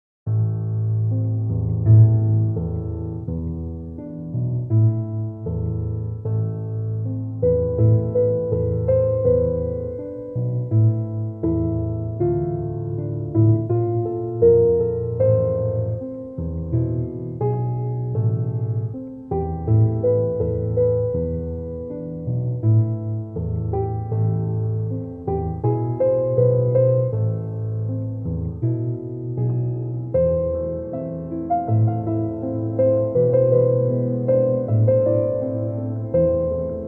Relaxing Classical Piano Music Piano Bar Music

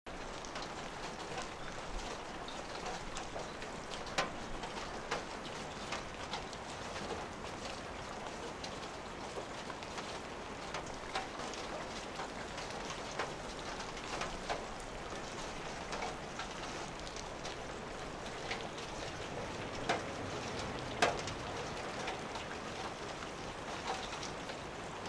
Baltic Nature Sounds, Calm Rain Sounds for Sleep

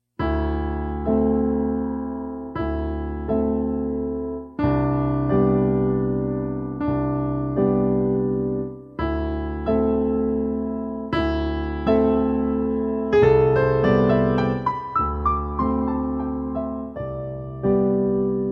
Slow Waltz for Piano Solo

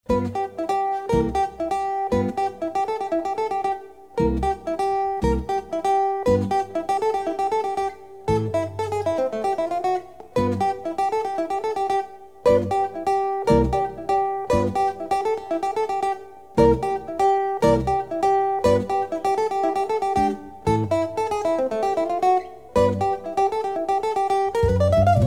Guitar Trio

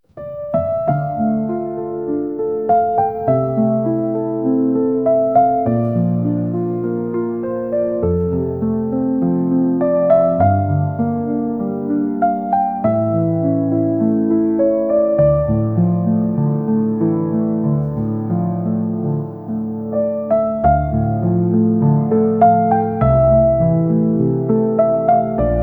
Sad Rain

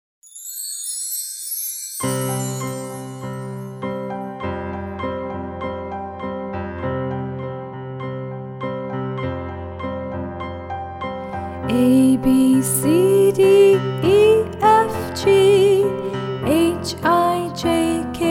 ABC Song Lullaby Version

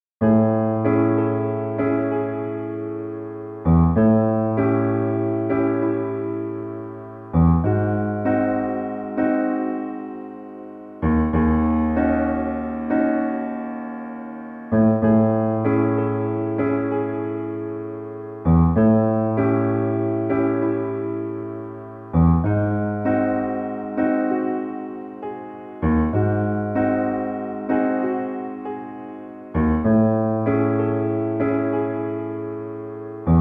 Bass Groove

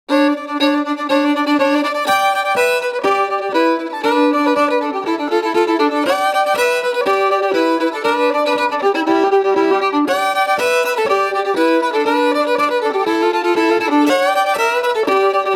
Country Fiddle

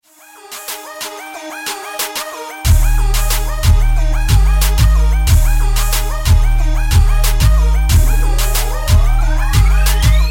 chain gliss

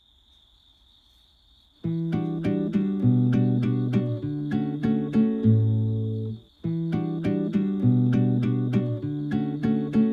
Acoustic Chill

